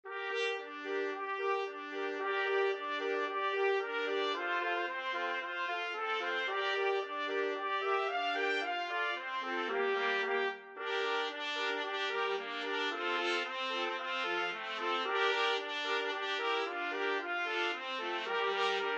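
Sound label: 4 trumpets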